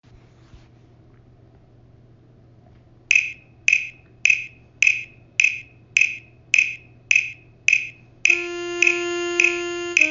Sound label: alto sax; harry potter